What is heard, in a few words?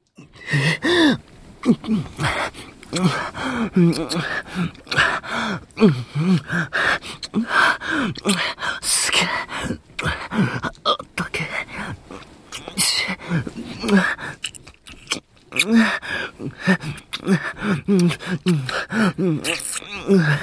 rain